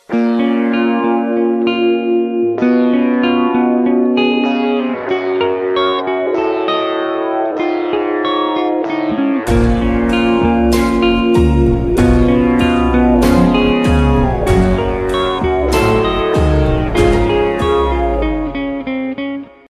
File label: Guitar Solo; FNAF 2